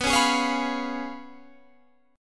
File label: Db 7th Cb, guitar; Db7/Cb strummed